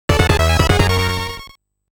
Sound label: Level Complete; Turrican Anthology Original Sound Version Vol. 1